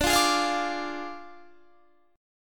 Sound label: D Diminished D, Guitar, Standard; strummed